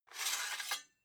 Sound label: sword sabre draw slow